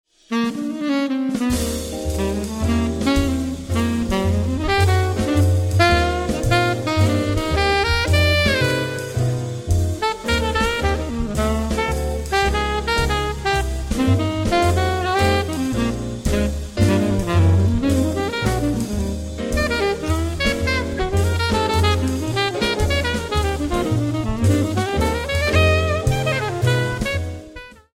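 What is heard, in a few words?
Alto, sax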